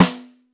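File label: MAPLE snare drum; East West; Global Sounds; R8M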